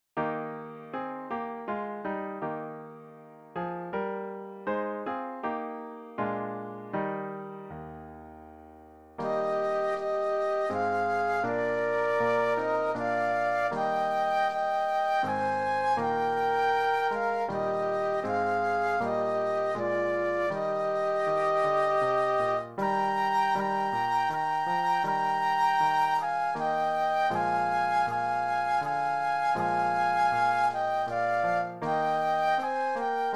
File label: Flute Duet and Piano; patriotic